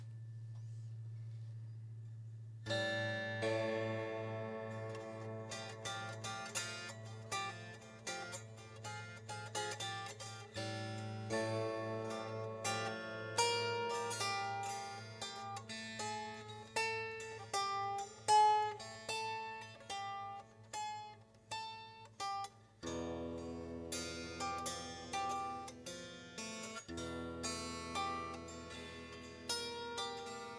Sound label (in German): improvisation